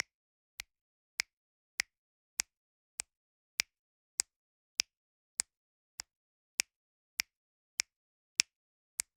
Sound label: Finger Snap Rhythm; Sample Growing Stream 002; Ear Candy